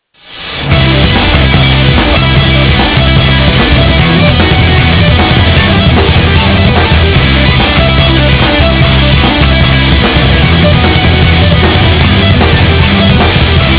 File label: symphony; HL1-Mecklenburg